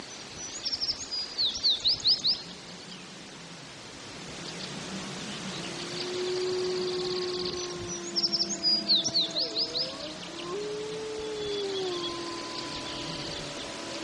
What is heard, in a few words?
Wolves Howling With Dawn Chorus; alaska acoustic highlights; MAMMALS